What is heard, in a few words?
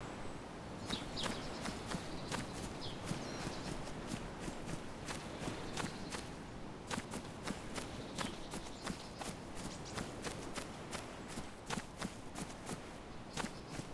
walking gras; dayz standalone